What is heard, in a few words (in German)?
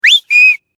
vox pfiff